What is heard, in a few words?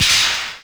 fence, Material; XBOX; Freestyle Street Soccer; In Game